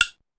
Woodblock, Pitched Up; Clavia DDrum-AT

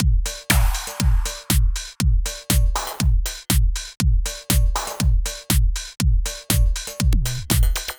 French Drumloop; Drumloops; Dr. Fink's Funk Factory

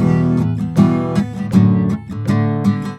EM GTR, Celtic Flavours; USB Soundscan vol.42; AKAI

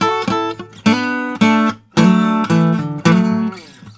Blues Guitar; ILIO; Fretworks

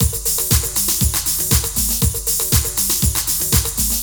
Drum Loops

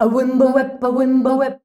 "AWIMBAWE" C, FEMALES

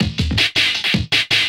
Loop, Drum & Bass, 160 BPM

